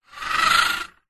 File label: leisure rc car pass by carpet